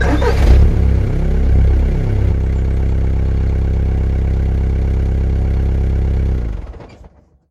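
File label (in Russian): VW T4 TD 1.9 запуск двигателя; 2000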